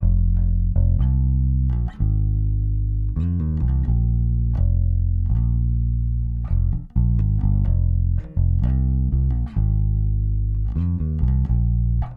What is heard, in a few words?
Emphasize Bass